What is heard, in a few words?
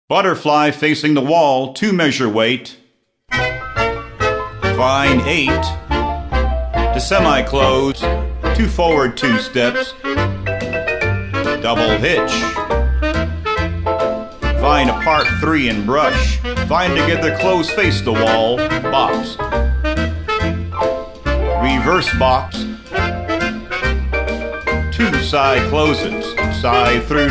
vocal sample